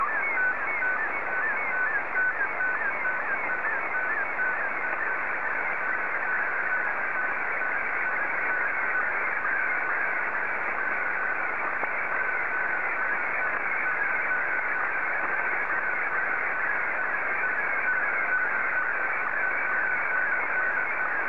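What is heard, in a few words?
Martin 1, slow-scan television; JA3QJQ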